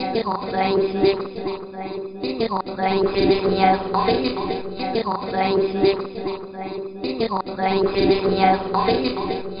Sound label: Mouth Music, Vocal; Sonic Foundry (Sony Creative Software); Myths of Technology